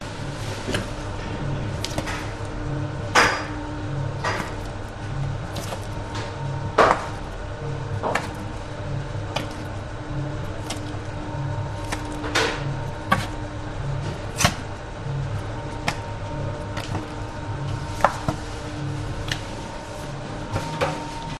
German Bakery Doug Mixer Running And Buzzing Metallic Noises In Background; your free sounds